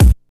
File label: old school trebly hip hop kick sound b key; kick drum; kick drum samples